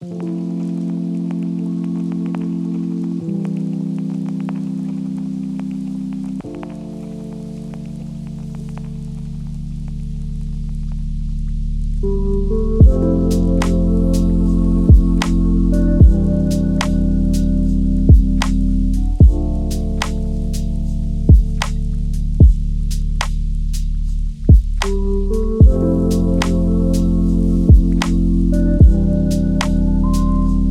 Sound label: lofi hip hop